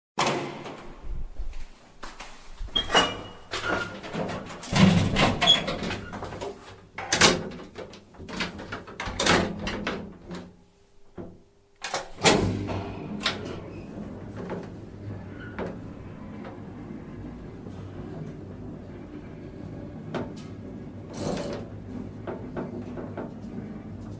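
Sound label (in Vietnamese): tiếng thang máy chạy hoạt động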